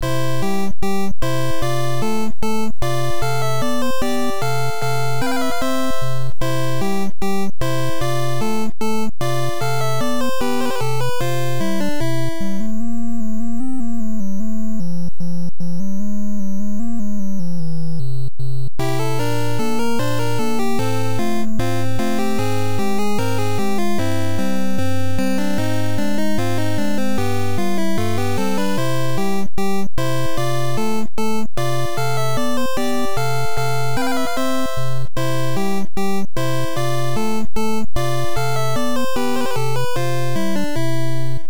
Piano Tune; Maniac Mansion; Family Computer

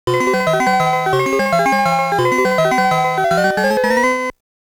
Start Music; super pac man

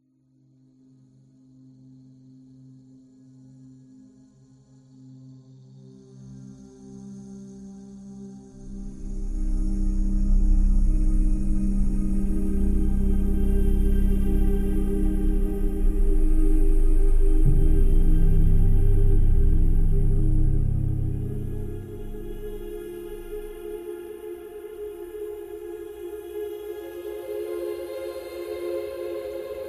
Explore Ambient; mass effect andromeda gamerip 2017; Intrepid Explore Ambient 02

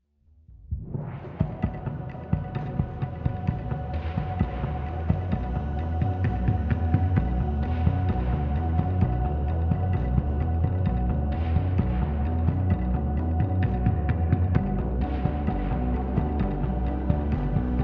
Cave Music Memory Full Intro

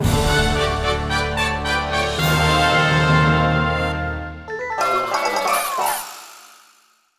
Item Get

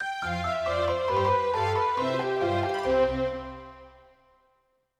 Event Happy; The Nightmare of Druaga Fushigi no Dungeon; PS2

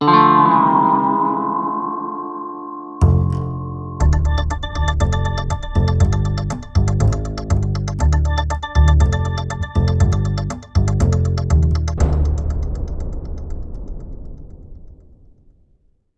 Quest Stinger; Buried

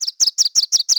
Cook Mushrooms; Levels Hub Swamp 04 Cook Mushrooms

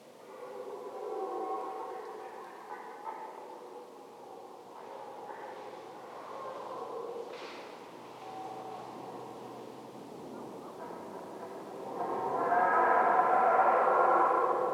Horror loop; Rezrog

